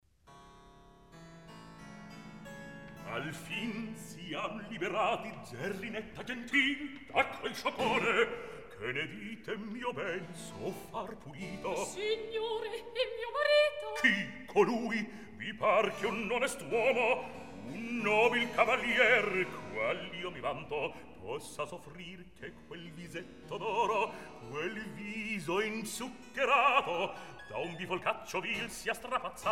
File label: Recitativo; Alfin siam liberati; Mozart, Wolfang Amadeus; Don Giovanni